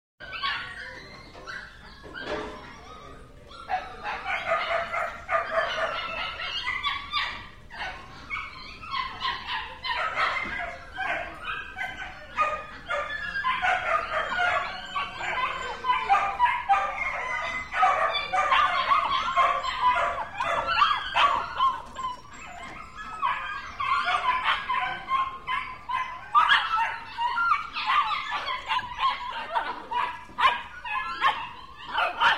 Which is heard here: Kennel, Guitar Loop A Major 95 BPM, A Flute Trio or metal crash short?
Kennel